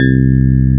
Electric Piano C2; soundbank; instruments

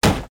wood damage; Bad Piggies

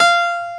Acoustic Piano F5; soundbank; instruments

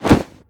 Pillow drop

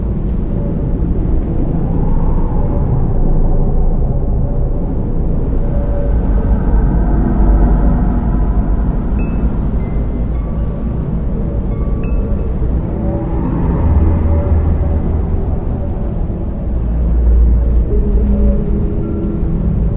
ambient maya; episode 6 levels

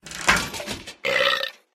craft empty

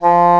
English Horn F3; soundbank; instruments

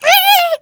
piglet fear; Bad Piggies

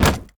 hit wooden crate

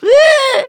piglet fear; Bad Piggies

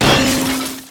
metal joint break; Bad Piggies

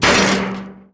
metal damage; Bad Piggies

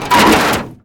metal joint break; Bad Piggies

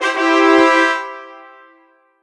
win trumpet

